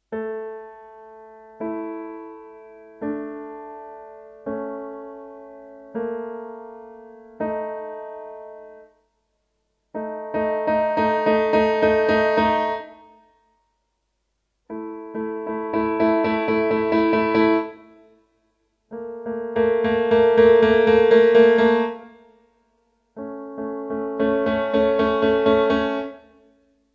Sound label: intervals